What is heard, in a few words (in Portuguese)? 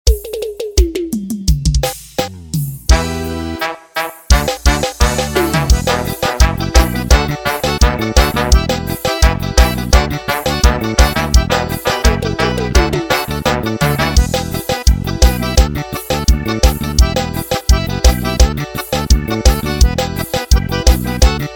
pisadinha playback